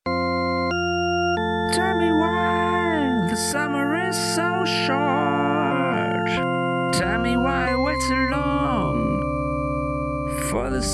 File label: europop; pingpongproductions